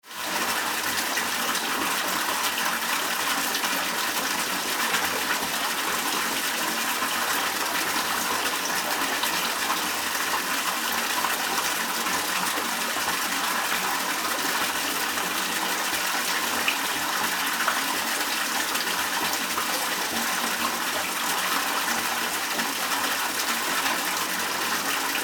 water filling in

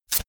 velcro large open fast

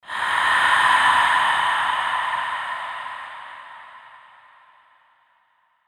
breath of doom

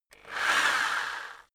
rock drill rev reverse short

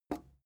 plastic button small simple release

